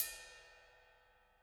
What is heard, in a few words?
Ride, Drumkits